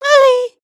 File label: curtsey, emotes